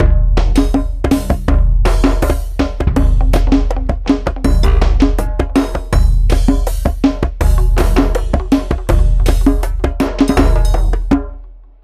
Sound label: preparation, jingle